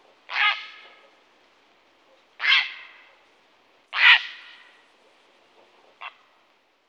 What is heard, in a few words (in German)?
Graureiher